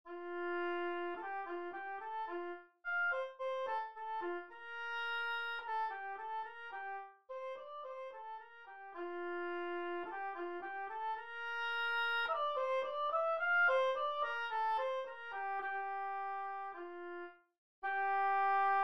sonatine; beethoven ludwig van

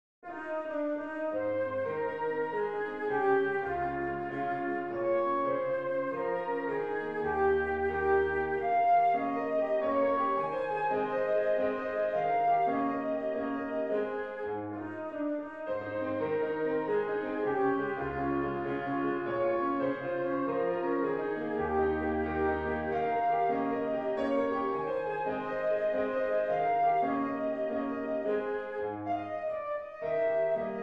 valse; beethoven ludwig van

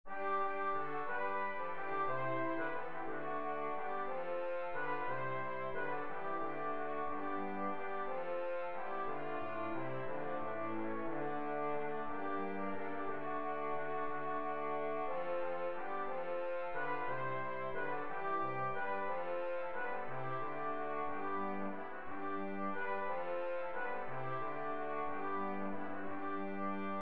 quatuor de cuivres

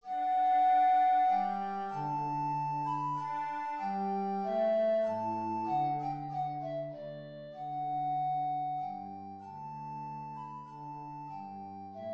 menuet majeur; lully jean baptiste

